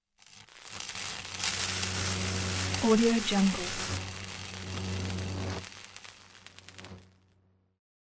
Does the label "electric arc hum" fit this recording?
Yes